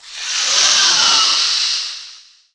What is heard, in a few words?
spider monster threat